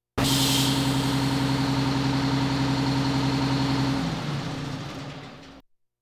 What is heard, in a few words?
Police, Fire engines